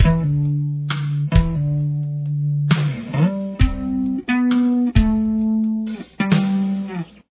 bass

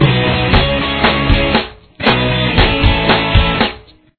loop, guitar

